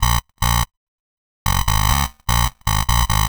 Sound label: distort, bass; Loopasonic